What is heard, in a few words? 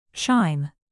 chime